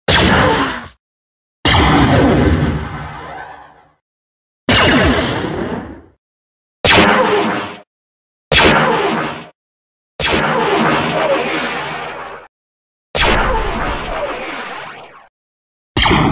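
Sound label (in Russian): турболазер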